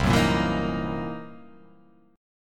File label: C 7th Sharp 9th Flat 5th C; strummed; JGuitar